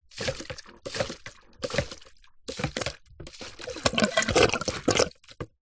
pump siphon hand plastic squeeze squirt gargle at end; industrial